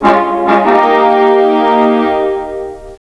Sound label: Tada; TrashMan 4.0.5